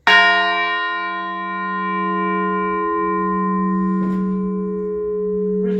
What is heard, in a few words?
horsham, bells